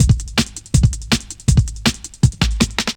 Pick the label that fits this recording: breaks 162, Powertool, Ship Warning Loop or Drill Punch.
breaks 162